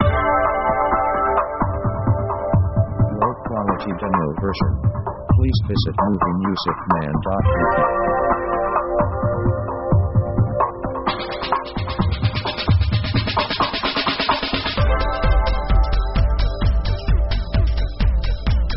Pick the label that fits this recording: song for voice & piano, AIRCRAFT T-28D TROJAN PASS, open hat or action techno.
action techno